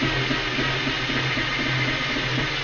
rotate drag; tuxpaint; magic

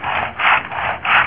saw, tools; household; stamps

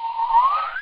distortion; tuxpaint; magic

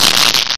xanthia, insects; stamps; animals

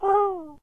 excited; faces; stamps; symbols

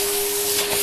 vacuum cleaner; household; stamps

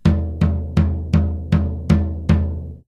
drum tomtom floor; music; percussion; stamps

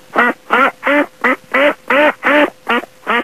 duck, birds; stamps; animals

